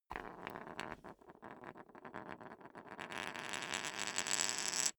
poker chip spin long; soundpool

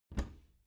bathroom cabinet doors open regular; objekte; tueren

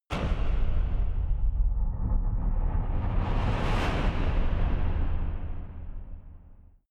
massive metal rumble

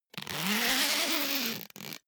zipper pullover jacket open

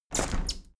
window handle plastic squeak close heavy; objekte; fenster

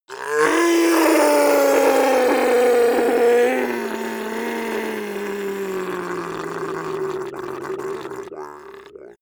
gnome groan extremely painful; schreie; comedy-cartoon; soundpool

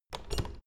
door plastic handle pull up creak

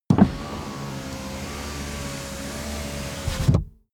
car window front close full

